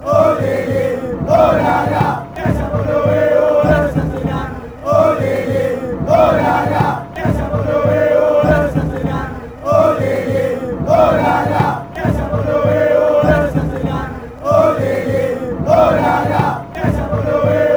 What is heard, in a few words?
olele olala, chant